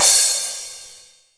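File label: cymbal, drums; Best Service; Real Mega Drums VOL-1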